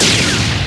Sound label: aat shoot, weapons; gmod; star wars vehicles episode 1